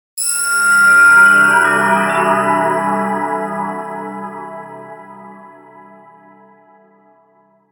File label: Swell Shimmer Soft Twinkle; Production Element Imaging Element Accent Transition; Blastwave